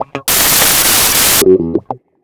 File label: gte 103 c#, guitars; house; Vocal House; PS-28 Vocal House Samples